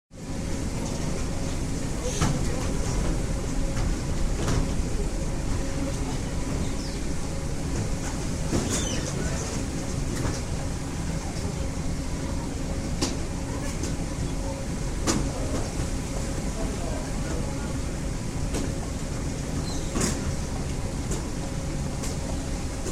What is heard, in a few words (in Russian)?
zvuk bagazhnoj karuseli; Звуки аэропорта